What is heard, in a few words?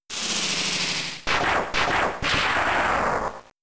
Shock Wave; Pokemon; Gen 3; attack moves; RSE